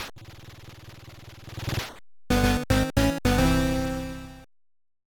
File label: Music - Jingle